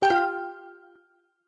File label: pause back click; cedru vax amas